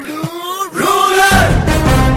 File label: Ruler